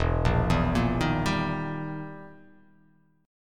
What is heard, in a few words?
E Minor Major 7th Flat 5th, E, down